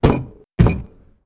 table hit

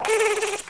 Buzz; Cut the Rope

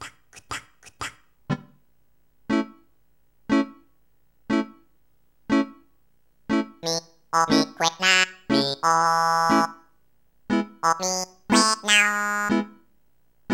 Reggae, Live; animal crossing let's go to the city

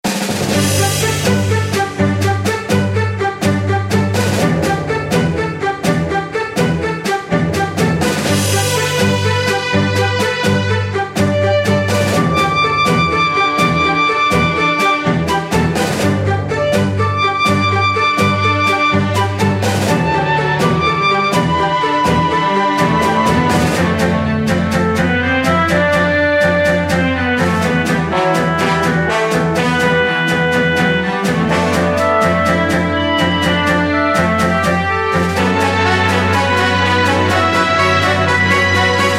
Bgm Fantasy; rpg maker mv

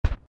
Triple Cannon Shot

